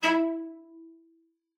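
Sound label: cello spiccato E4, cello; Xsample VOL-5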